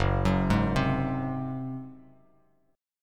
F sharp Suspended 2nd Flat 5th F sharp, Bass Standard; arpeggiated; JGuitar